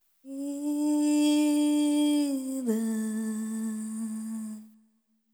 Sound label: ETHNO FEMALE, Vocals; ethno2